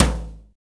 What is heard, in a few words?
ETHNOTOM LO, Drums; Drums In Your FACE!; FILM KIT2